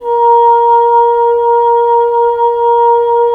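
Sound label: wind bassoon; LA Composer vol. 3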